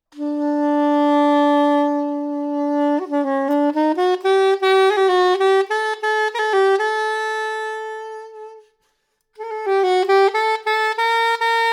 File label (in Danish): sopransax; eastwind